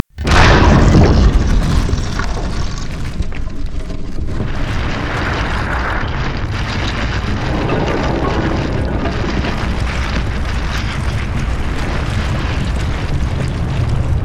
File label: stair extend; odin